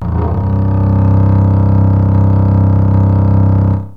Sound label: CELLO